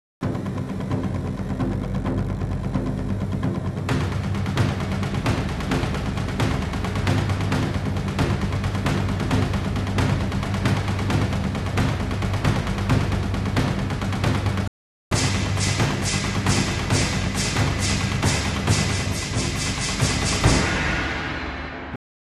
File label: drums